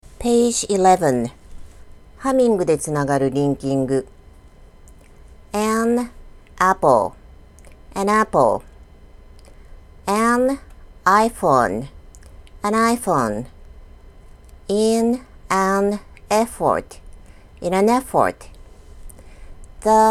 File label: humming